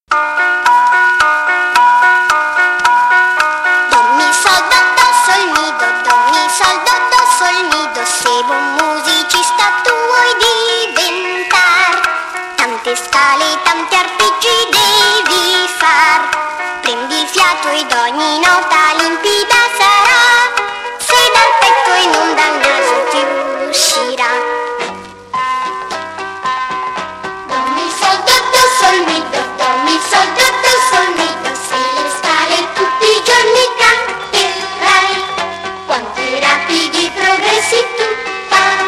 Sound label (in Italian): SCALE E ARPEGGI; CANZONCINE PER BIMBI; CANZONCINE PER BAMBINI